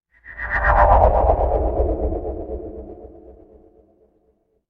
magic scifi teleport

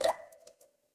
water drip

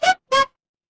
feedback level up

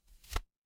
paper fabric page turn

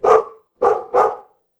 animal dog bark

